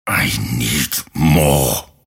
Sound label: deny pain; grimstroke